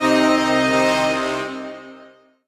End Stinger; The SpongeBob Movie Game Sponge on the Run; ios android; Invincibubble

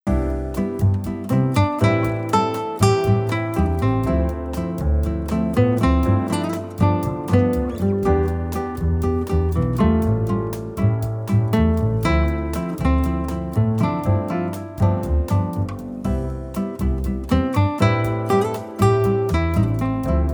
Flamenco; IKEA Smart Home Planner

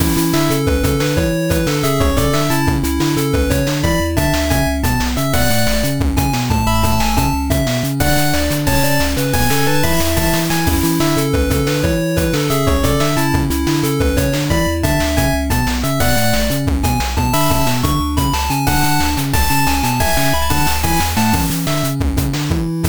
8bit; Hina-chan Maize Tower; Toho RPG; android

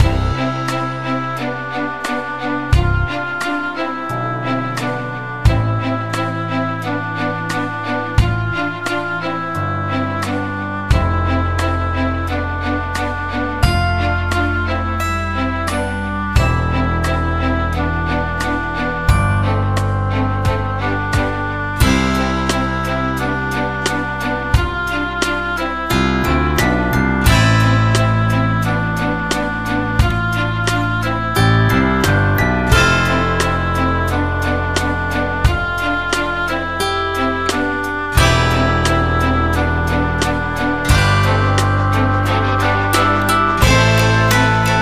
MENU GAMEOVER; Scribblenauts Unlimited

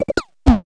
cute buildup explosion; gonner linux macos ps4 switch windows xbox one gamerip 2016

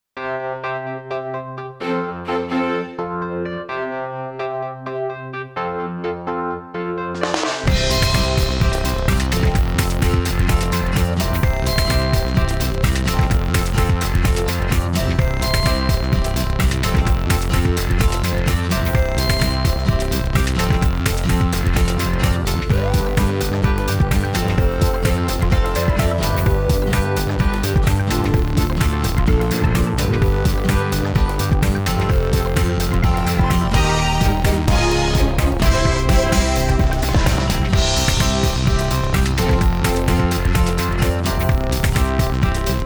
Karaoke version

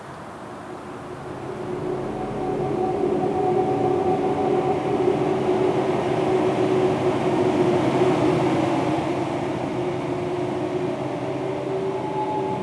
ambience city; Aragami

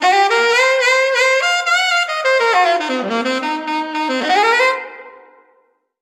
Opening Solo; Sam & Max Save the World Remastered

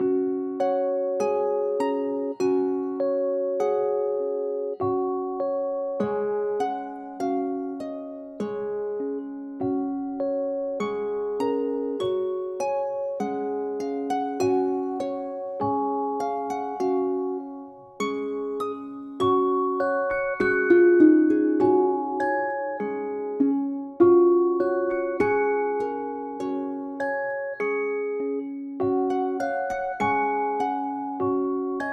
Tranquil Forest Loop; Kitaria Fables; TD Tranquil Forest Lite Loop

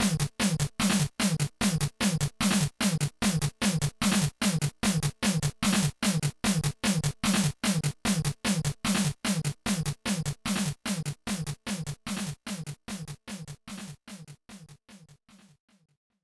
Mono Stereo Transition Beat; super spy hunter; nes

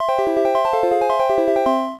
Hole In; zany golf; apple iigs

